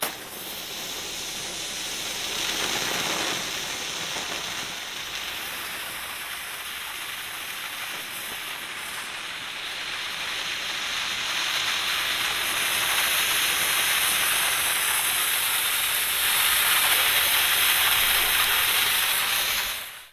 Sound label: fire fuse; best service Blue Box